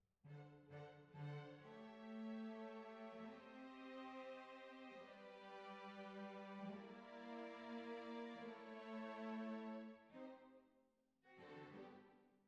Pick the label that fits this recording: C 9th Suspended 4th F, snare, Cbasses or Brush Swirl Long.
Cbasses